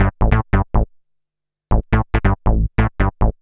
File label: SNTH BASS ELCTR 140 A, Techno